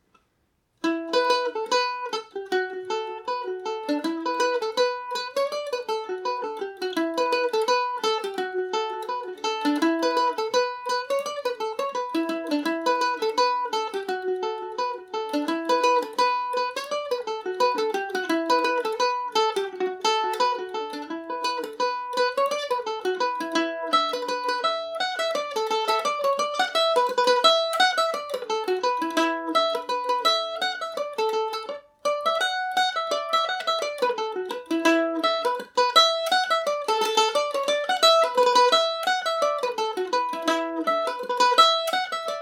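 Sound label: E dorian, Irish mandolin; molly ban revisited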